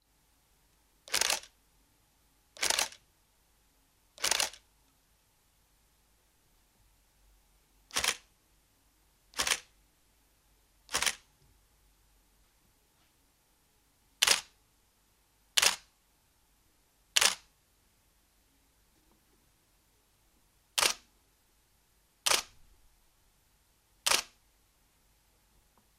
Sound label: noise